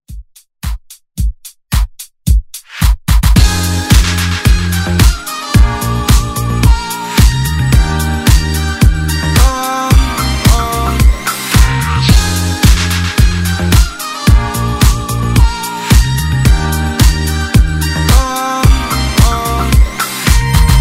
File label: Pop Top40 Redrum